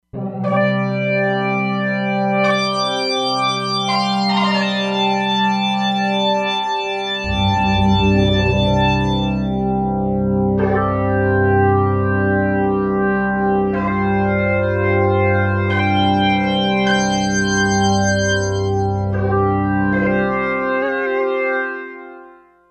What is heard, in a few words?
Desert Dawn, E-mu Proteus-3; Factory patches